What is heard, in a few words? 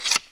openWin; squidGame